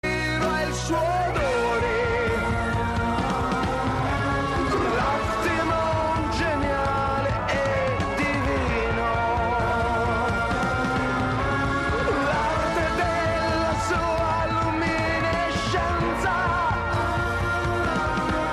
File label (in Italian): Respiro